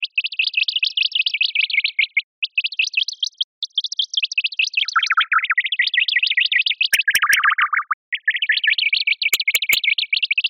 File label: Birds